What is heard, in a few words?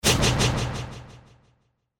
Burst Delay